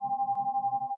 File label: Red Alert; Voyager